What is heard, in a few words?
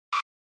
Tire Squeal; SNES; Racing